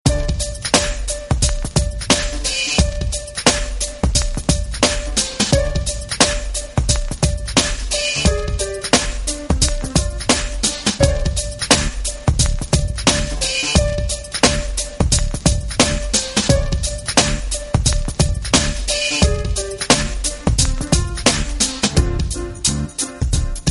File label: music Game; subwayrunner